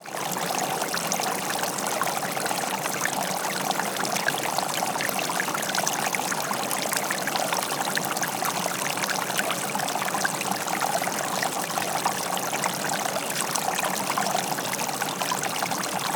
wilderness flowing water stream small running slightly over rock; Bluezone Corporation